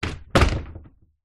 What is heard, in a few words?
household large wooden window shutters close; zapsplat